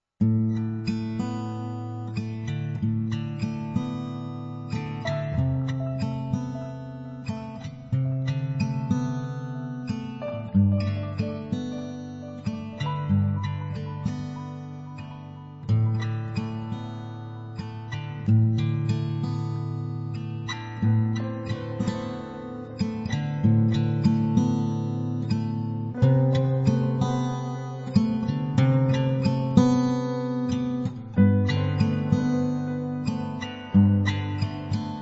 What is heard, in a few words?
Acoustic Rain lofi